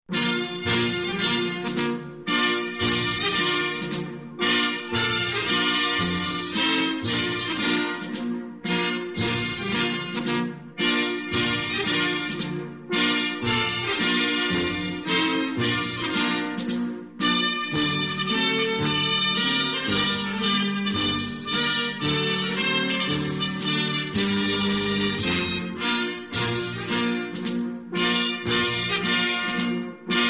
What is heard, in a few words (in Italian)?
inno italia